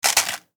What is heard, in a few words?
Coffee Maker Fill, Household, Coffee, Maker, Fill, Coffee, Grind; Appliances